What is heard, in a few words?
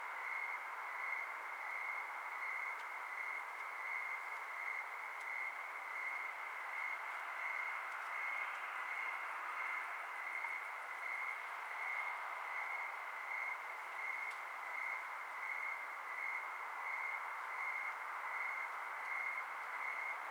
insects night quiet